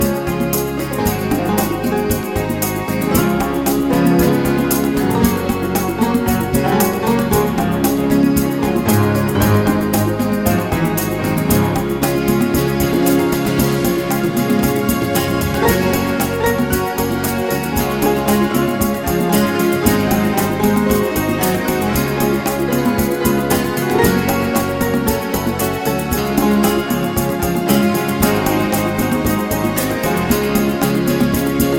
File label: Love a Beat